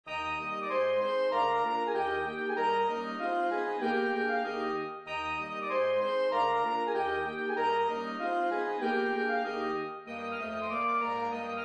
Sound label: Saxophone; Grainger; Country Gardens